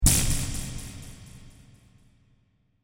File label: Hard And Heavy Laser Impact; Andrew Fleming